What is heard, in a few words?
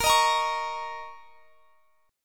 A sharp Minor Double Flat 5th, A sharp, down; A#mbb5 strummed; JGuitar